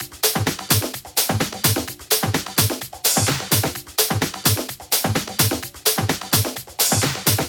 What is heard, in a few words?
128BPM Latin Kit; Latin E Kit